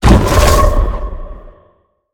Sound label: activate; smite; god cthulhu